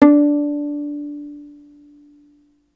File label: Violin Extended Technique